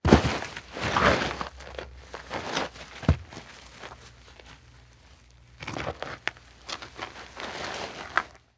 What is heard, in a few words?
Impact Human Fall To Knees And Dragged Slowly; Impacts